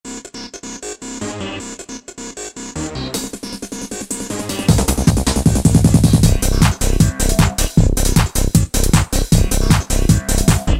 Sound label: Euro Tech